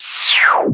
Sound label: Laser On Off